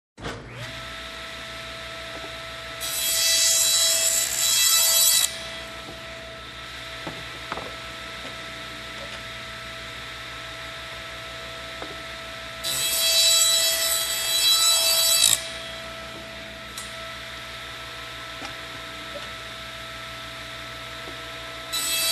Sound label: Hydraulic Cutting Machine